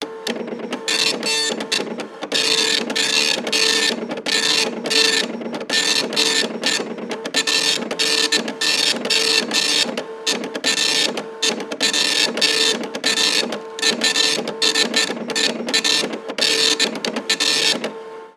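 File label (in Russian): матричный принтер печать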